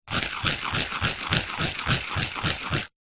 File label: stone small scrapes circular motion fast on rock or brick; foley